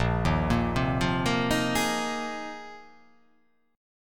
A sharp 7th Suspended 2nd Suspended 4th

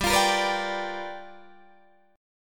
G 7th Sharp 9th G chord, Guitar Open D

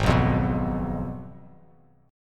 G sharp Minor 6th G sharp down; G#m6 strummed; JGuitar

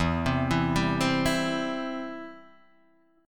E Minor Sharp 5th Guitar Drop D; 2,3,2,0,1,0; down

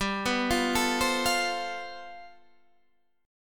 G 11th, Guitar, Standard; G11 arpeggiated

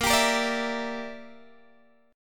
Bb 6th Flat 5th Bb, Guitar DADGAD

